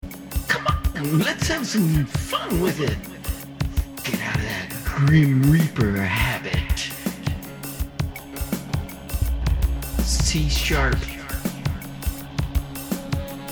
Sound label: Soundcheck